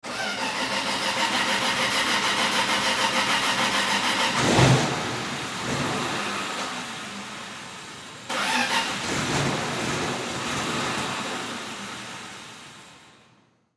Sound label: Tractor Tow Stall, Tractor Tow Aircraft Start Stall; Transportation Aircraft